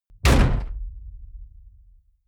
sci-fi shot noise weird soft; schusswaffen

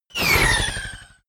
sci-fi laser shot long; soundpool

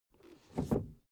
suv 1st gear release slow soft; verkehr transport; autos

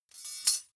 midsize metal thin clank catch

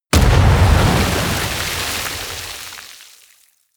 explosion large water bubble, comedy cartoon; soundpool

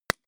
gun shot silencer medium; schusswaffen

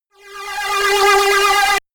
shot uprising shine; pulsieren

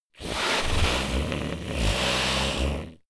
monster snore breath low; comedy-cartoon; soundpool

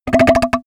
toilet bowl fart wet; koerpergeraeusche; menschen; soundpool

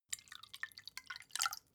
glass pour faint; soundpool

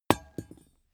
small metal drop clang soft; industrie wirtschaft; metall

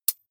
midsize metal thin hit; industrie-wirtschaft; baugewerbe

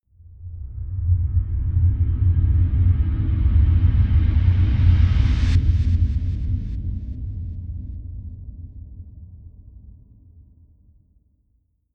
whoosh slow rumble; swooshes-swishes

